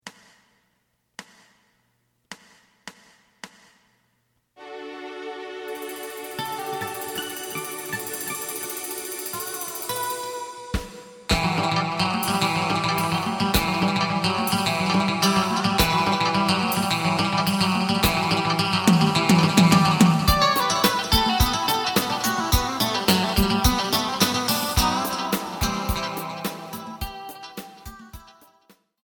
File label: bass